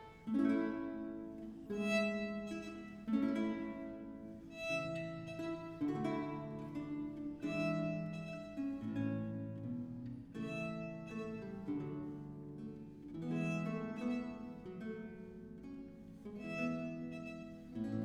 Sonata violino solo representativa, for violin & continuo; Biber; Representatio Avium; A major